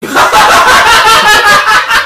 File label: maniacal laugh